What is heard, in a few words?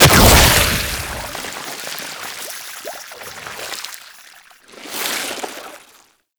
Inked Enemy Death; Warframe; Tenno; Ink Rifle